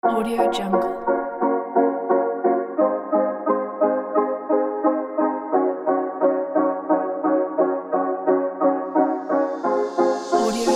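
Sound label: downtempo ambient guitar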